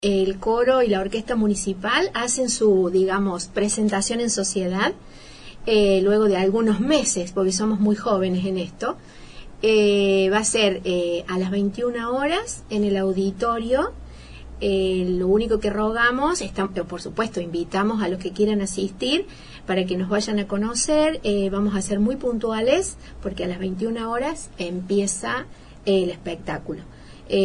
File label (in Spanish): Coro Orquesta